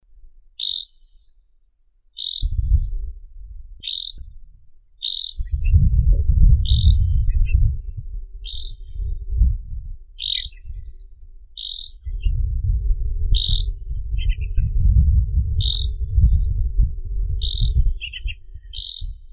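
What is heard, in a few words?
grosbeak, Mycerobas carnipes; dubonos archovii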